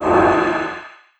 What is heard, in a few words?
Arcane Magic Death Underlay